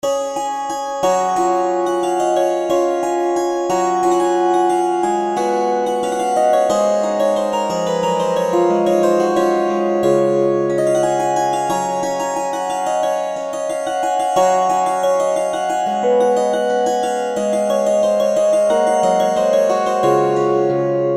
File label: zither; dowland puffe